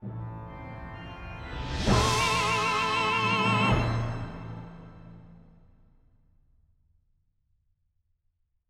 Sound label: Jingle 3 130bpm 12beats; Count Arelle